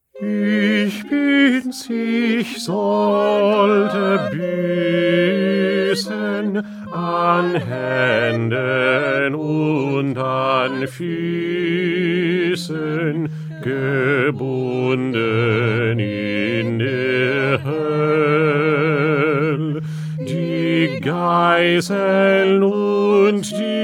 Bass; Bach; St Matthew Passion

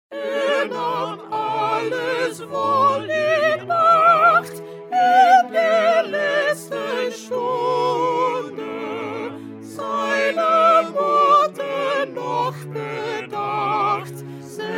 Soprano; Bach; St John Passion

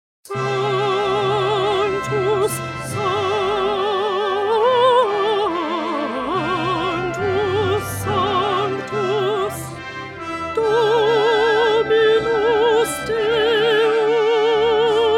Alto; Mozart; Coronation Mass

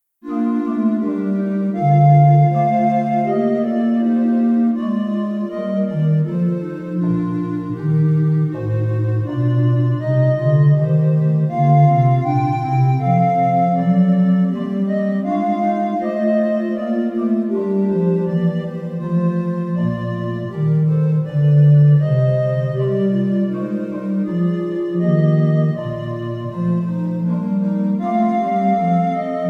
Instrumental; Bach-St-Matthew-Passion-3

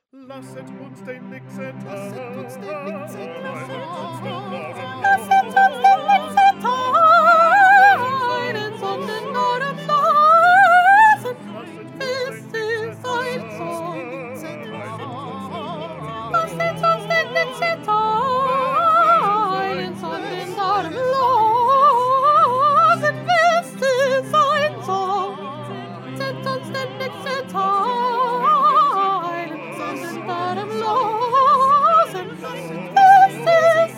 Soprano; Bach; St John Passion

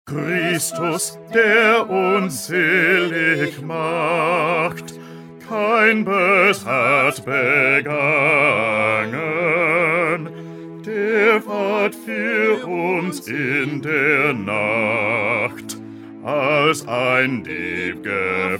Bass

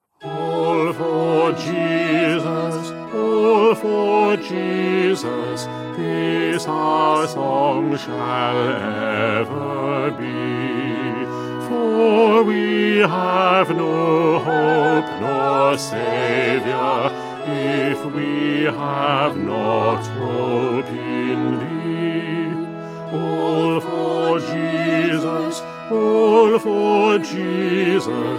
1st Bass

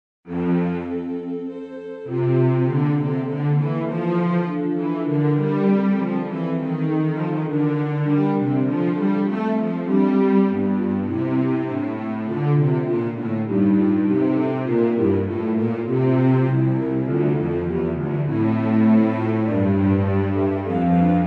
Instrumental; Bach; St John Passion

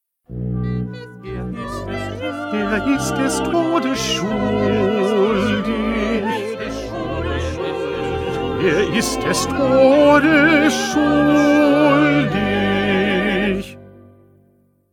Bass; Bach; St Matthew Passion

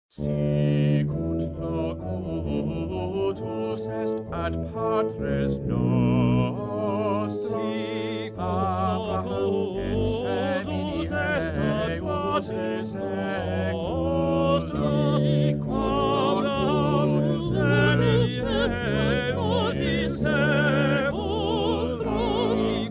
First Soprano; Bach-Magnificat-11

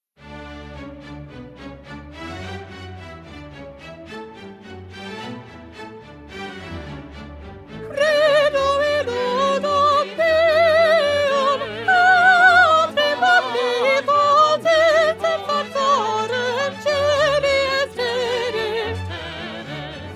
Soprano; Haydn; Nelson Mass

